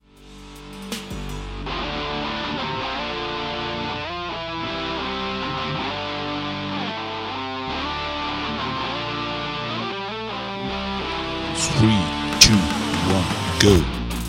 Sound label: Beats Tabata 160bpm